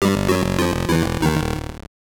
Lost Game; power spike pro beach volleyball; gbc

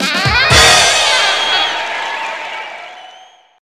System Se Ok Jazz; Ready 2 Rumble Revolution; Wii

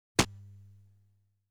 Sound of Player's Feet; Dragon Breed

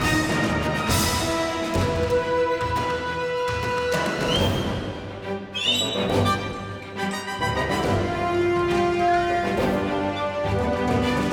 Cinematic Music

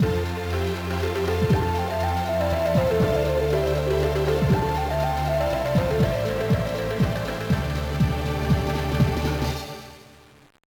Jingle; Don-chan Puzzle Hanabi de Dohn! Advance; gba

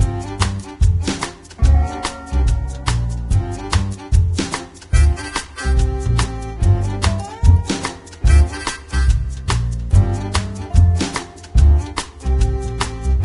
Train Music; Diner Dash Flo on the Go; NDS

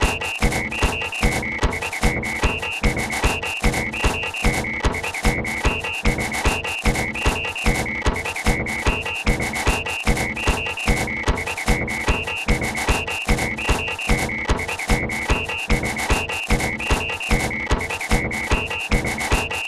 Goal

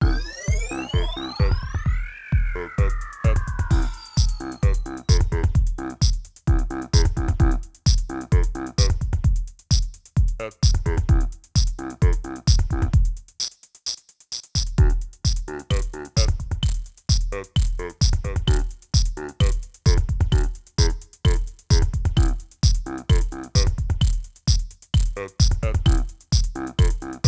Beat

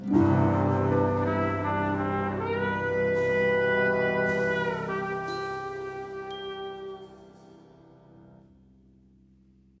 Sting; lego the incredibles 2018 pc; Mx Hub New Area Reveal

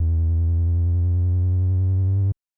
Tile Appear; Kabuki-chou Reach Mahjong Tonpuu Sen; SNES

